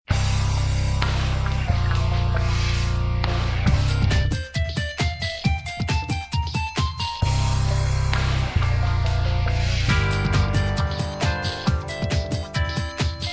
Loadloop; The Sims Online

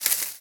footstep foliage; Scrat

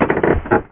LEVER

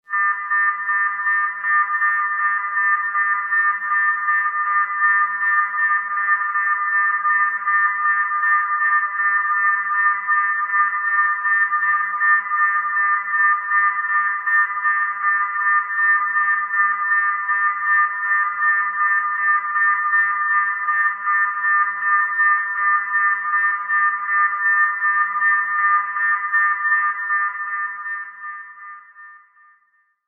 alarm space station

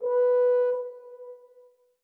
orbit hornsolo; Sky Children of the Light gamerip

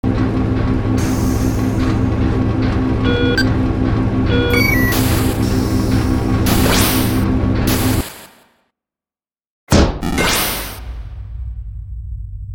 Teleporter; Infiltrating The Airship

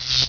Electro Path; bejeweled 2 deluxe

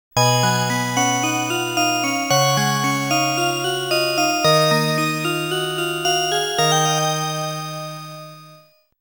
GAME IS OVER; Final Soldier

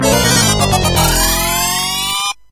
Combo; addams family the bally pinball

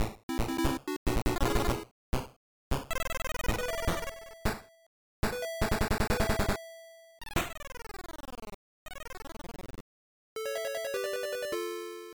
Creche Videogame; Baby Life; nds

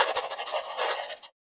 Writing

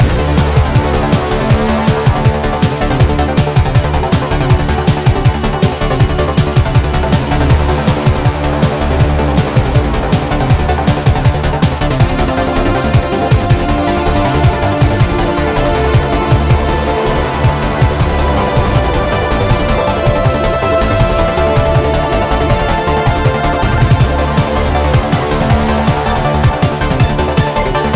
Mus Combat Loop Only; Space Tyrant

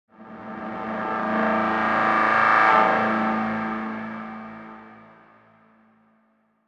Shady Ambience Jingle

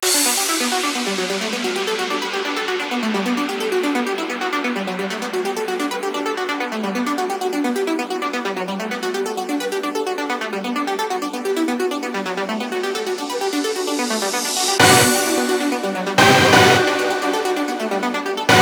Shipe Shoot; Nemesis III

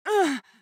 female pain shout; out of reach treasure royale 2020 gamerip pc

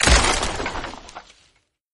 tower destroyed sound; Arc of Templar

